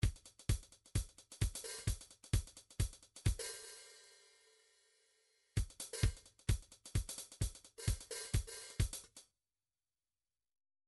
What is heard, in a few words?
midi drums